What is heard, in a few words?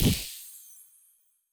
shoot ice; Learn to Code From Zero With Godot; spells